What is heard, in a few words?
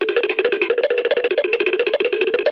vintage temple block run, Cartoon Sound Effects; Cartoon Sound Effects Kit